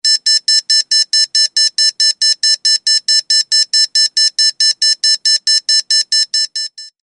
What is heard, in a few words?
Alarm Digital Beeps